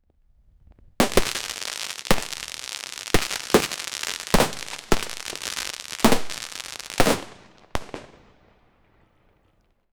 fireworks end confetti

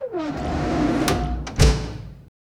big mechanical pneumatic, machines

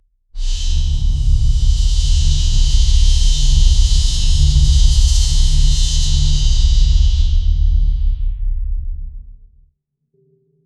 drum wind combination fas; percussion

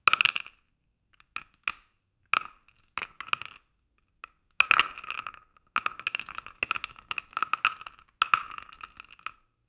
deep plastic snap firm, lego

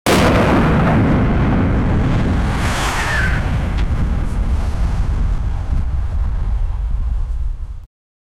enemy plane blast sound; destroyed

